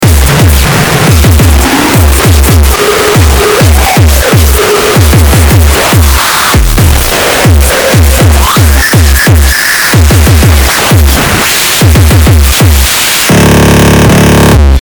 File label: drrrty noize howse; lolicore